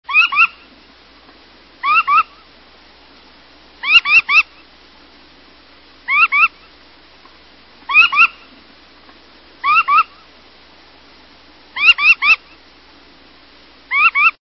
Red Knot, songs

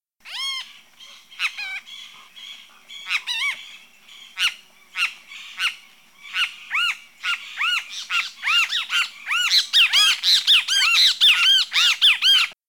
Great Kiskadee, songs

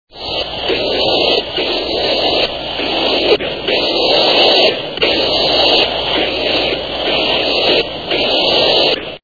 Cassins Auklet

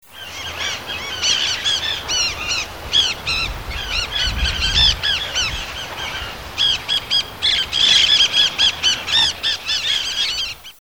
Green Parakeet, songs

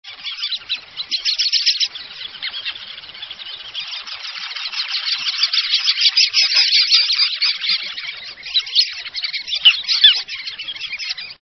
Ruddy Turnstone

